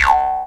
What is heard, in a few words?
C# JAW, Primitive Instruments